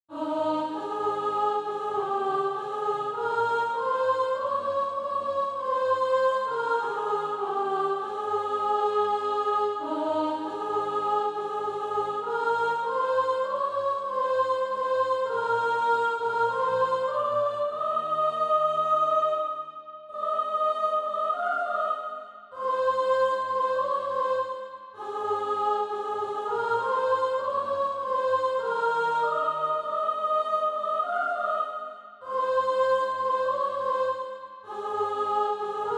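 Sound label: soprano, SATB Practise Music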